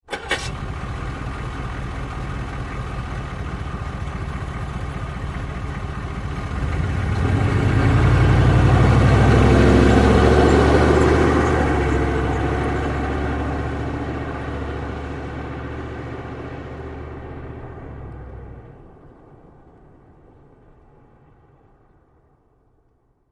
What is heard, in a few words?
Humvee Start Idle